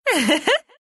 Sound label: laugh, ru; dota2